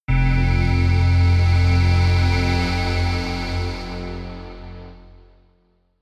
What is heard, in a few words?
synth sway deep